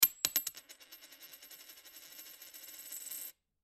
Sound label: spinning